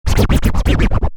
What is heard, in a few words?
Scratch, Record, DJ; Musical; Stuart Duffield